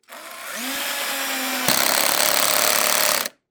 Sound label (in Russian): shurupovert, zvuk shurupoverta